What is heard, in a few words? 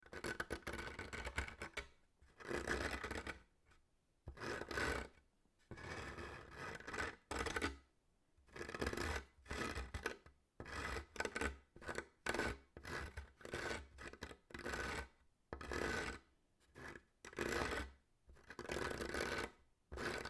finger nails scratch wood